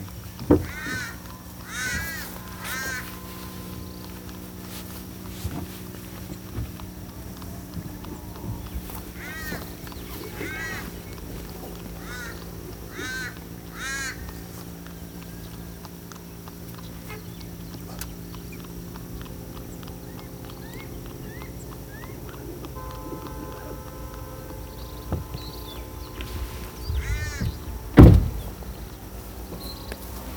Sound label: Cuban palm crow; کلاغ نخلی کوبایی